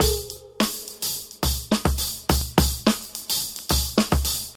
106 bpm drum loop c key, Free drum groove - kick tuned to the C note